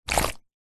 household wet cloth drop into ceramic sink splat squelch; zapsplat